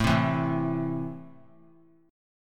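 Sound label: A Minor, chord strum; Am strummed; JGuitar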